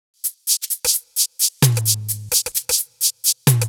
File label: Drum Loop 130, Downtown House; Drum Loops 130bpm